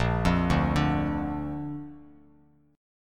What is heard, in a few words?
Bb Major Bb down Bass Standard, chord; arpeggiated; JGuitar